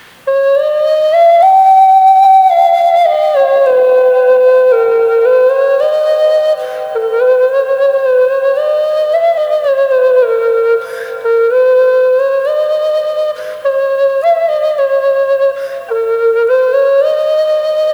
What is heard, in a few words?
Indian side flute